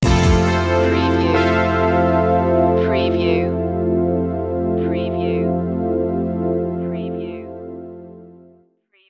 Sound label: airy sting